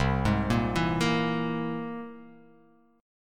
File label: C Augmented 7th, chord; arpeggiated; x,3,6,3,4,5,x,x; JGuitar